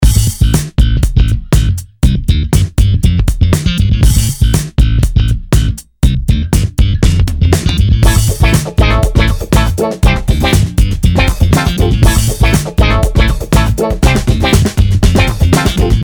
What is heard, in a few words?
funky guitar; trilian; trilian test